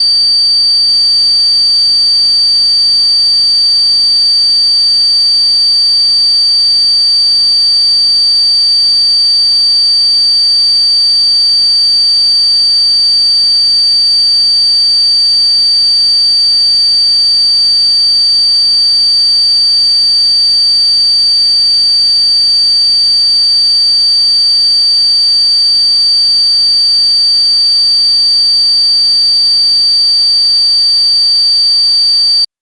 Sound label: hum; sonniss gdc